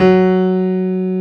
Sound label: PIANO F#2; AKAI S-Series CD-ROM Sound Library VOL-2